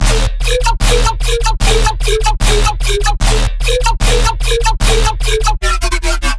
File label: bass loop 150 D#; healthylunch; Virtual Riot